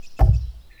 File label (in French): 1x Bonne Buche, Percu Bois